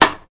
00s rap snare drum sample a key, Royality free snare sound tuned to the A note